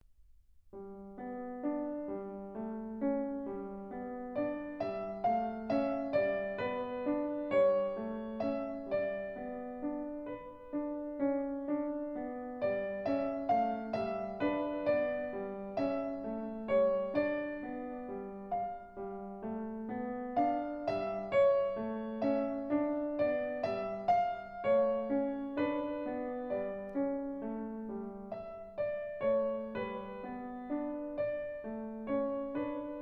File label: Melody with Accompaniment, Piano; Book 2; Mikrokosmos, Sz. 107; Bartók; Complete Solo Piano Works